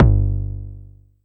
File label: Tempest 808 Style Kick